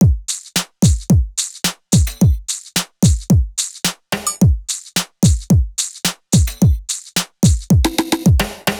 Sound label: Drum